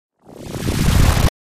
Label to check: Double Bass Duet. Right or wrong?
Wrong